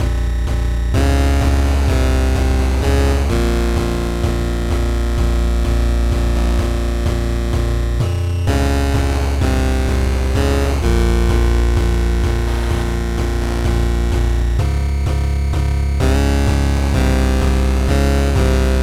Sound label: 8Bit Theme Killer loop